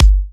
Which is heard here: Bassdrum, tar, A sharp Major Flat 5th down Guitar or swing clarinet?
Bassdrum